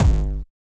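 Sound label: Bass Drum Hard; Yamaha DJX-2B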